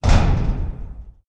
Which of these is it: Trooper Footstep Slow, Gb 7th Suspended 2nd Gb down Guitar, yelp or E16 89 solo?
Trooper Footstep Slow